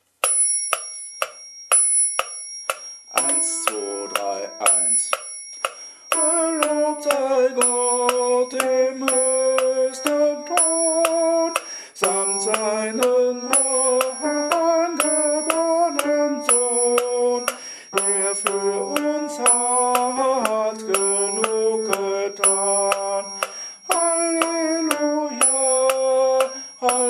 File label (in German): Gelobt sei Gott Tenor